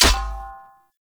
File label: Great Sword Melee Impact Thrown All Surface; Weapon Impacts; Founders Great Sword; Environment Thrown